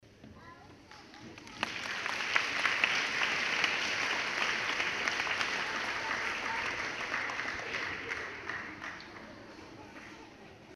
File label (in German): Klatschen